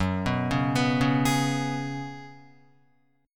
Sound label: F sharp Suspended 2nd Suspended 4th, Guitar; arpeggiated; JGuitar